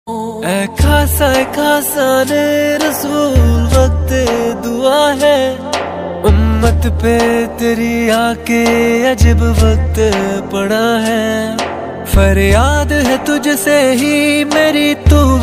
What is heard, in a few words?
islamic ringtone